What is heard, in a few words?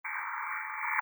keyboard; topview